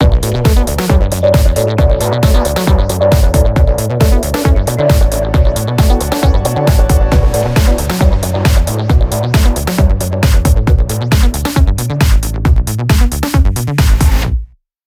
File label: sync music; itgmania